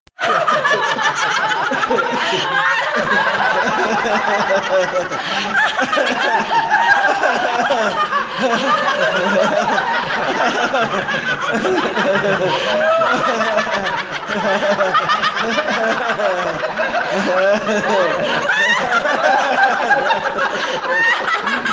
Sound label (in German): Lachyoga